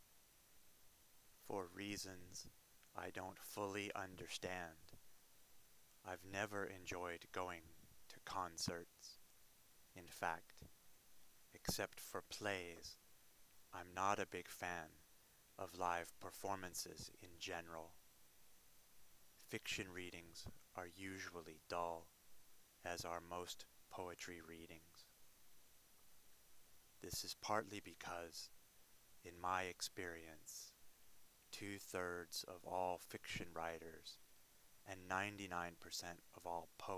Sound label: Monotone Drone